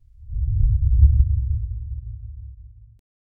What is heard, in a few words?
mirror reflection, relaxation